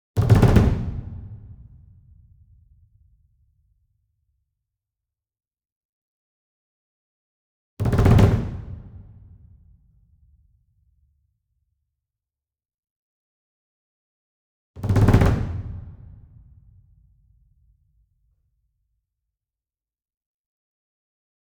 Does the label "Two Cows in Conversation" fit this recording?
No